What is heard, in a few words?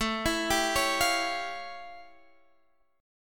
A 7th Flat 13th; A7b13; arpeggiated